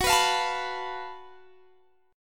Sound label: Gb Minor Major 7th Double Flat 5th, Guitar, Standard, chord; strummed; x,21,21,22,22,19; JGuitar